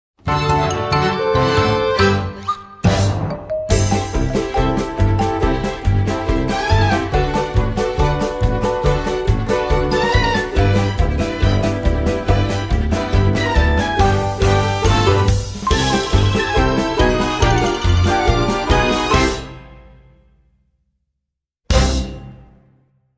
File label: Luau Hawaiian Treat Accompaniment